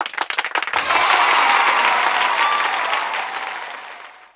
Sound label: Ambient Sound; Pangya; Fantasy Golf